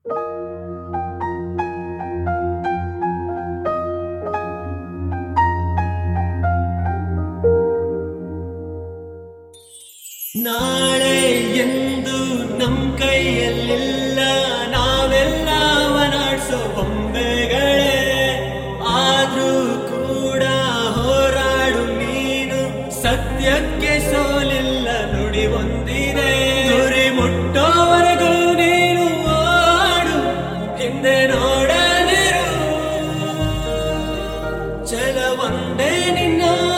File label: motivational song